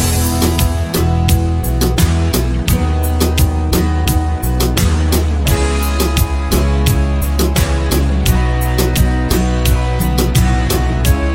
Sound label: Xiaomi Mi Note 10 Pro Ringtone